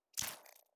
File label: ui popup